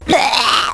Amoeba Death; AmoebArena

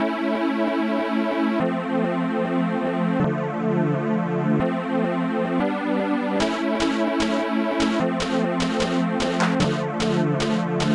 fly wings; relax

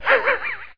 laugh

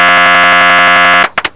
machine

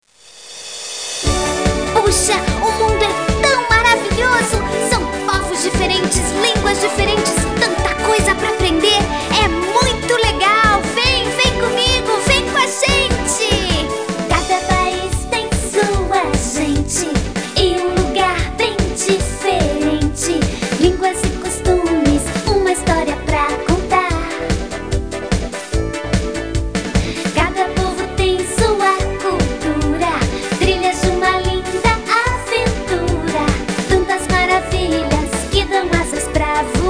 jingle; GEOGRAFIA

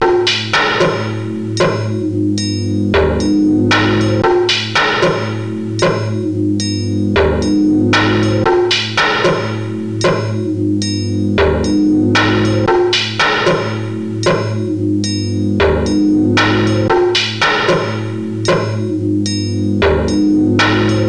beat loop; Next Generation DRAMs

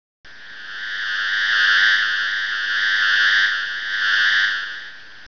buzzy

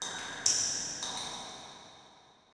PIPES, pipes; PC Gamer IT CD 40; zones

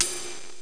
RIDE

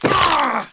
player land hurt; PC Gamer IT CD 41; Daikatana

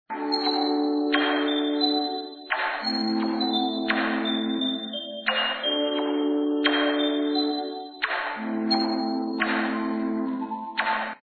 ice cave; loa and the island

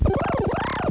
Whir; Blake Stone

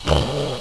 Gnaw

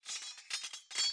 SWORD

